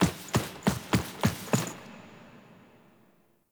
Foley; Narmer Outpost; Chamber Archon Intro; Drifter

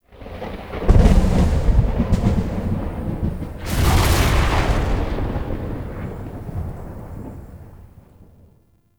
Void Storm Explosion; Ambience; Zariman; Warframe; TheNewWar

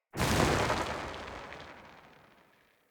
Aoe Shockwave Impact Echo

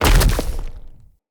Root Spider Mother Land Dirt

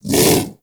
Vocal Bark; Hunting Critters; Predasite

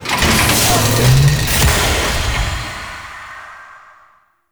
Battlecrafting UI Open; Warframe; Railjack; Player Ship

